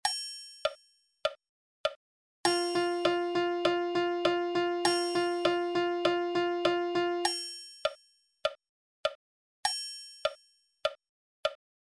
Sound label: music 2 notes